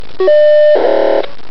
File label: Pocsag